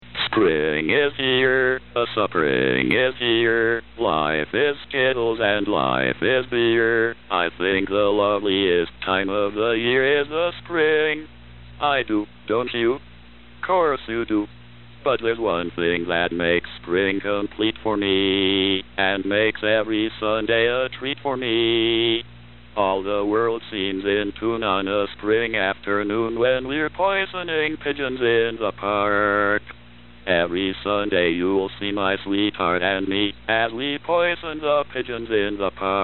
pigeons

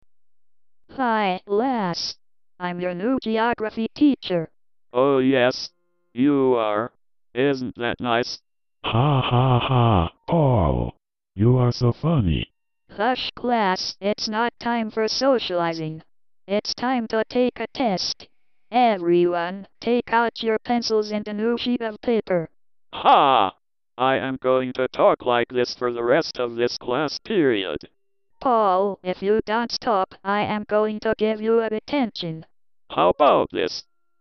Fire

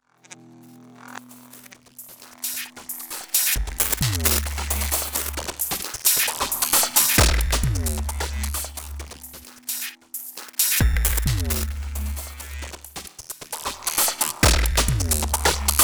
Beats; Mike Benoit; Reconstructed Owls; Imperfied Corroticks

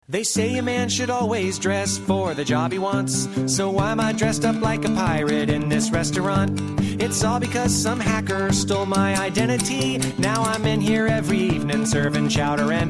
singing pirate; free credit report

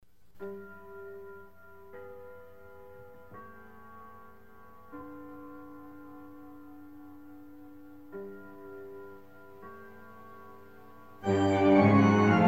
The Haunted Organ; The Ghost & Mr Chicken; halloween for all times

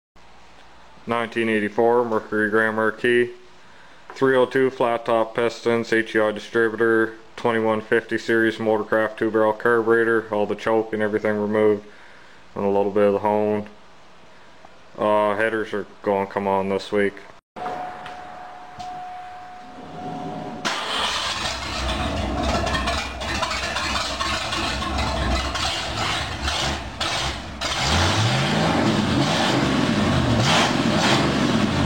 Choppy Idle Open Exhaust

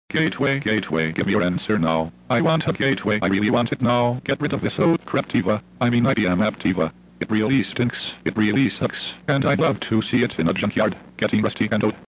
sing

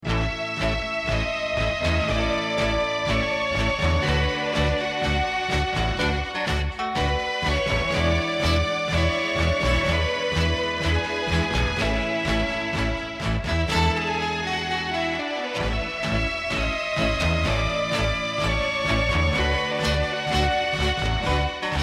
Tango tango; Dawn of the Dead 1978 Unreleased And Incidental

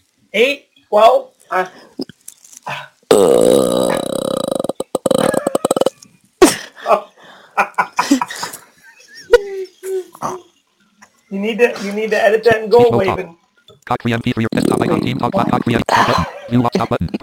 music lover burping

